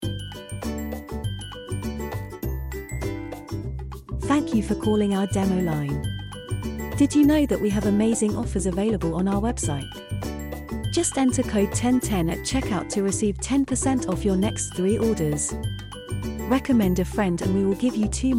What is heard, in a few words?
On Hold